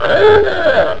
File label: Horn Upgrade, Kart; AOS RF1 Kart Demo